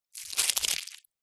household foil small scrunched up wrap movement

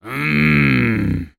Screams Shouts monster male clean; soundbits

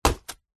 foley footstep single barefoot walking cardboard; zapsplat

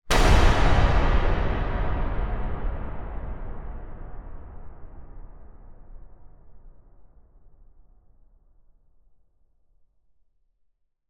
sound design hit impact metal large horror cinema

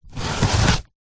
household tissue pull remove from box

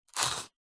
foley polystyrene foam rub scrape short; zapsplat

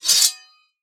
warfare sword draw from sheath fast; zapsplat

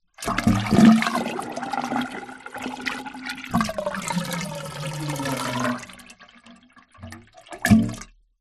household bathtub drain gurgle

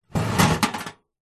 metal toolbox with tools inside tilt movement; industrial

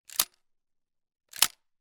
weapon gun; charles daly; still north media

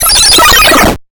science fiction radio receiver signal received

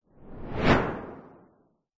whoosh fast

